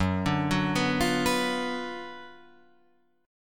F sharp Major 7th Suspended 4th Sharp 5th, Guitar, Open F; F#M7sus4#5 arpeggiated; 6,9,6,6,8,6; chord shape